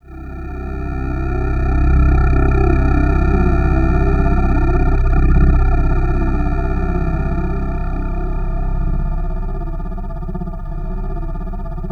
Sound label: suspense, special FX twisted; USB Soundscan; AKAI